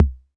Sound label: perc mod tom